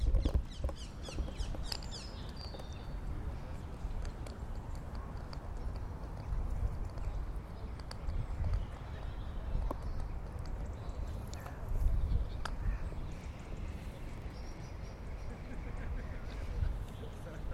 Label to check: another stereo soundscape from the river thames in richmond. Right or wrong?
Right